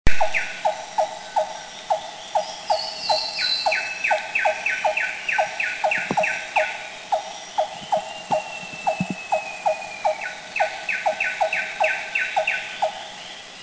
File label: Red-throated Barbet, Thailand